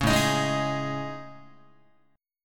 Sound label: A Major Sharp 11th, Guitar